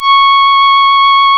REED C#; Big Fish Audio; Synclav FM

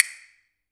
CASTA AMB, CASTANETS

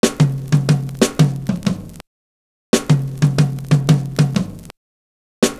TOM FILL; Univers Sons - Kitch 70s

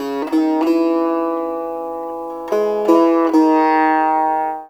SITAR; Spectrasonics; Heart of Asia

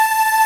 Ending loop; SNES; Dracula X (Castlevania V - Vampire's Kiss)

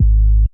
Sub, drum

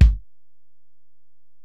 Ac BD, Alesis DM-PRO; 300 Drum Machines

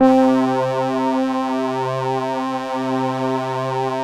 LAMBDA, String Machines

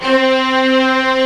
ARCO STRGS M; OMI - Universe of Sounds

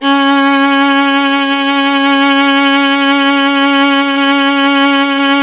viola C; Miroslav Vitous; Solo Instruments VOL-1

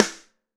ST AMB SN, STEREO AMB SNARE

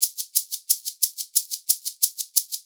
shak, percussion loops

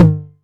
Tom Hi, Novation Drumstation

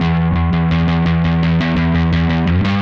Static Bass

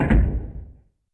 Door Slam; YAMAHA RM1X

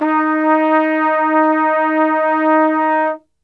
D#2, Brass; Best Service; Brass Super Section

